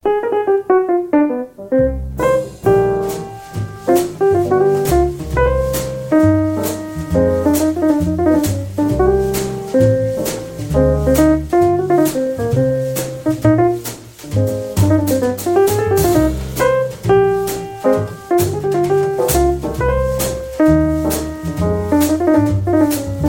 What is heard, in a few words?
smooth jazz music ringtone